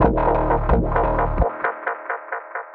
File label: D Submerged Bass